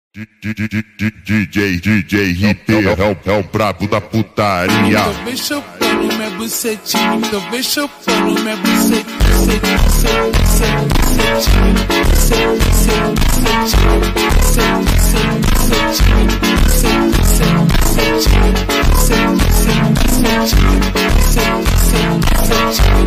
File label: Montagem Vozes Super Slowed Reverb